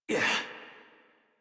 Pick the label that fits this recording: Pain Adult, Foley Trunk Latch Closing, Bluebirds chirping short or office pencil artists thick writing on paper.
Pain Adult